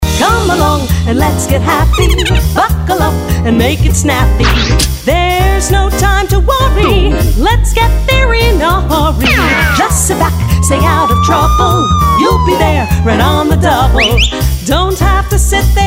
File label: 100 BPM Kool Car Radio